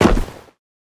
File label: land snow, footsteps; player